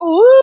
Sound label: ooh, Voice